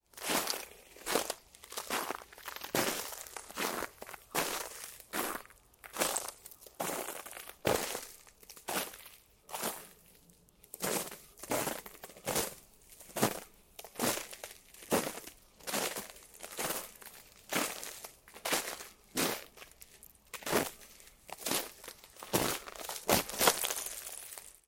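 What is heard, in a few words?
sounds of footsteps on ice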